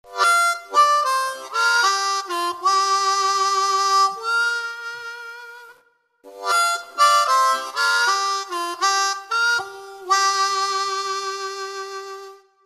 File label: Tongue, harmonica